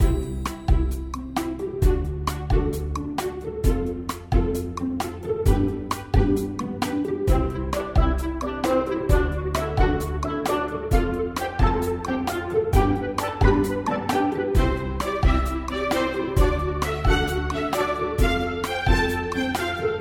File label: gameplay; jewelpetsmatch